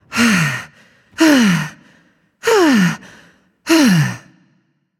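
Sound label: breath, female voice; smite; voicepack; hera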